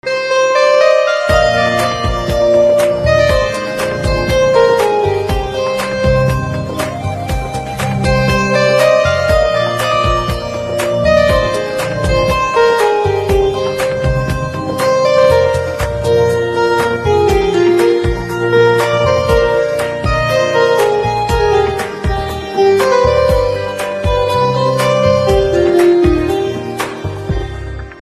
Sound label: Piano Instrumental Ringtone